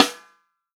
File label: FUNK SNARE CHROMATIC, REAL DRUMS